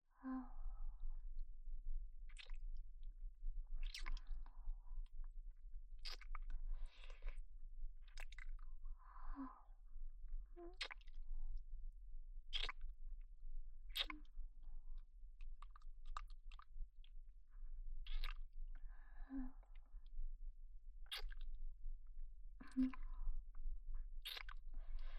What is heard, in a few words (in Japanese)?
左乳首舐め弱め